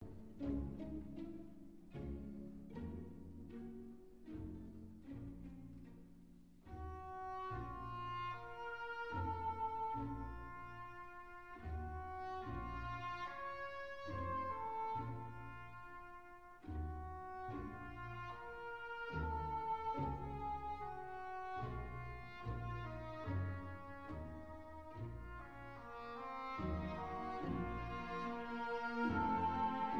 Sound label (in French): cor anglais; Franck